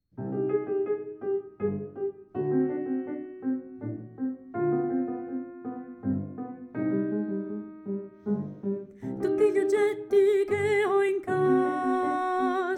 Voce Pianoforte, canzoni satiriche

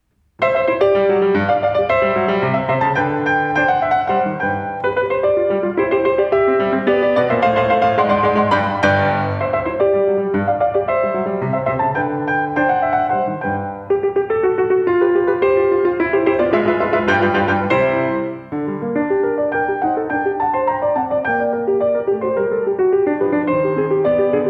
Sonatina n.1 IV Tempo Pianoforte

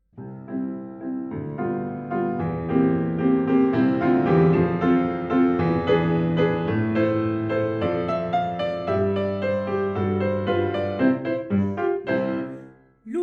Voce Pianoforte, canzoni satiriche

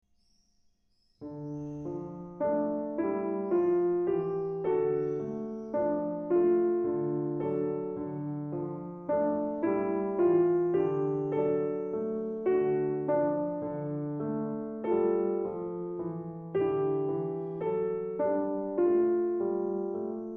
Cinque piccoli pezzi per principianti 5 Andantino Pianoforte

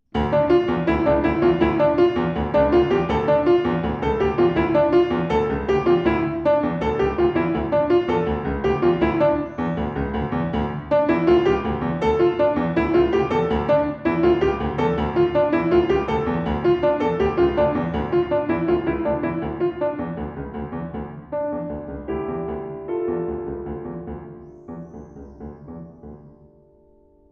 Ostinato Pianoforte; Cinque piccoli pezzi per principianti

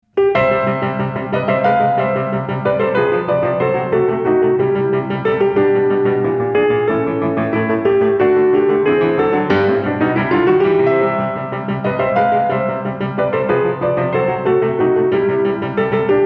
Sonatina n.1 I Tempo Pianoforte

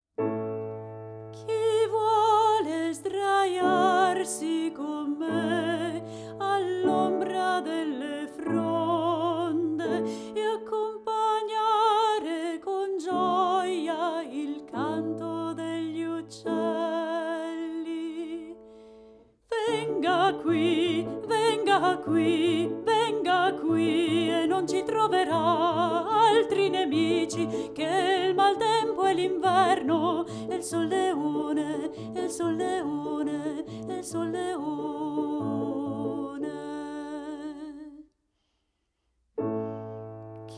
Voce, Pianoforte; Quattro Canzoni per A piacer vostro

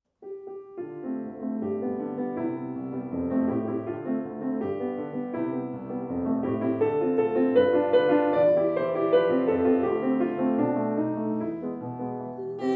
Voce, Pianoforte

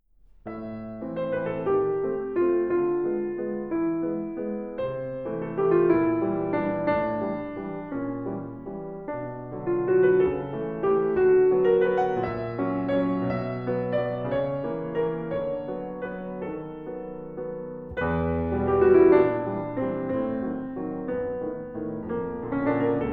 Suite di danze 1 Valzer Pianoforte

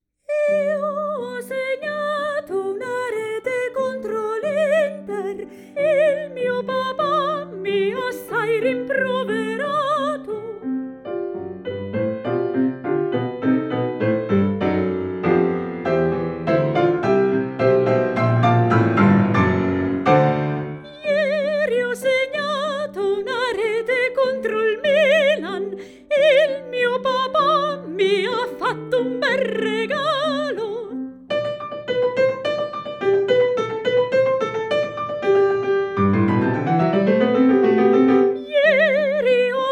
Fischia Pietro Voce Pianoforte, canzoni satiriche

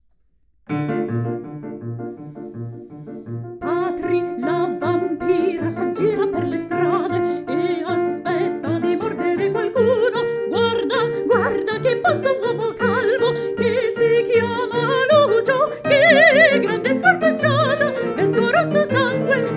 Voce; Pianoforte

